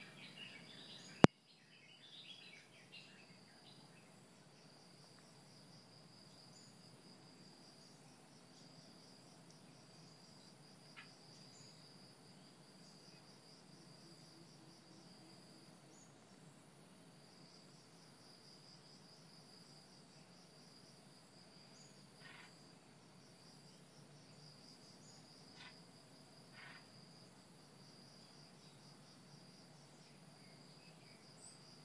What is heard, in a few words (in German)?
morgen geräusche